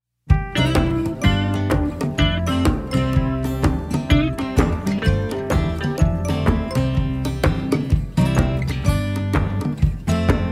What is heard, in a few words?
Instrumental